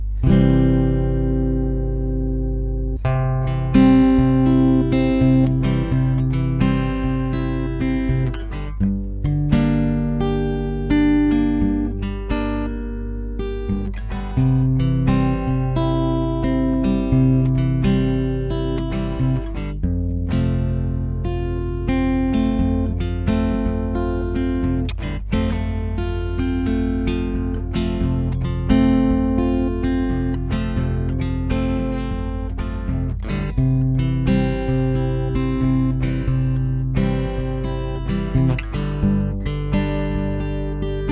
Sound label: Slow guitar filler; backing tracks